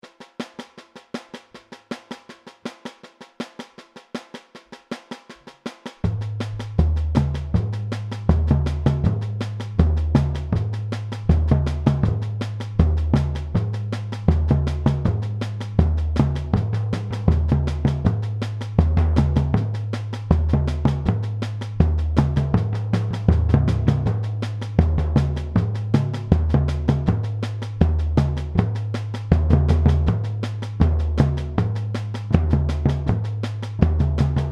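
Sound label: surdos variations, samba; Pirate Samba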